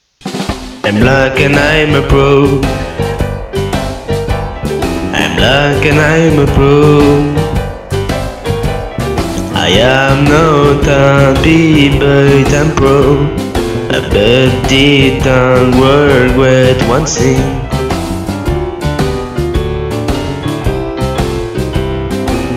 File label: blues